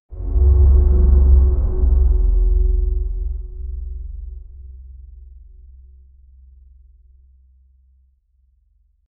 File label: deep earth shattering